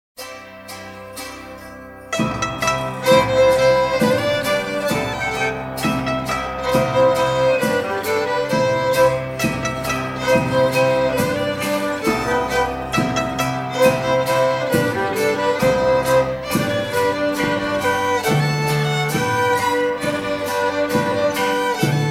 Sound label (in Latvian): latviesu tautas melodija trimskinpolka